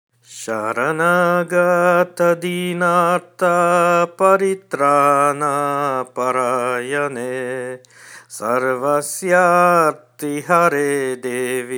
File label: durga sloka